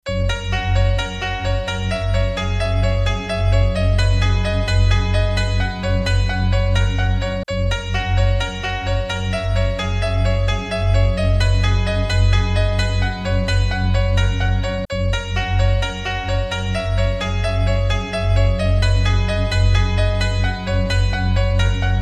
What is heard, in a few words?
Luv Takes Over Pian